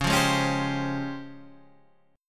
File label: Db Major Sharp 11th, Guitar Standard; strummed; JGuitar